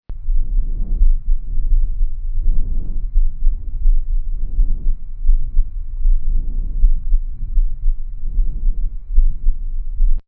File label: normal breathing